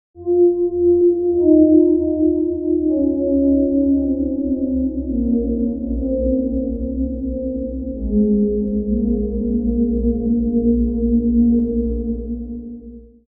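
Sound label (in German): Eines der kühlen Pads; TWIST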